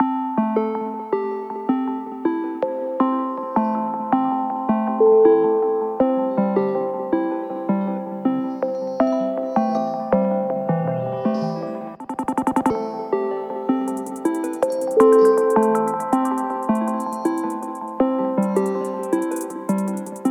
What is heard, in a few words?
minimal clean tech